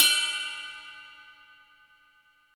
wet ride cymbal sound clip G key, Royality free drum ride sound tuned to the G note